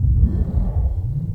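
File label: Ambience Sci-fi Energy Loop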